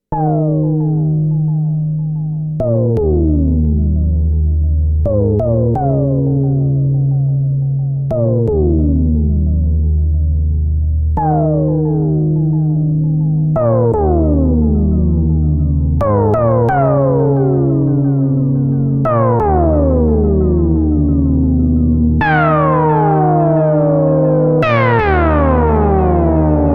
Dominion X ADSR control VCO, synthesizer

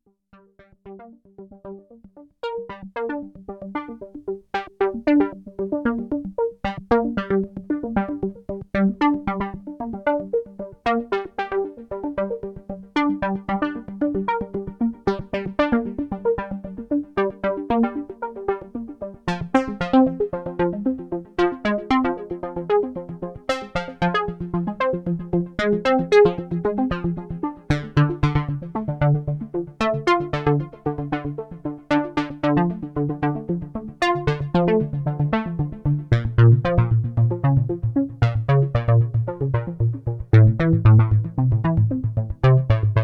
jx8p stereo chorus

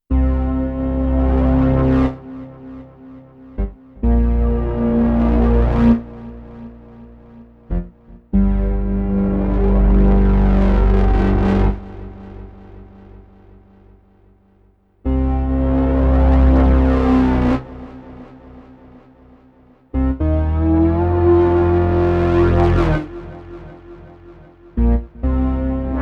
Modal A8 Drone FX3 off Dotted 8 Delay; Zimmer

